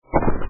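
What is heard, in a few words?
crunch explosion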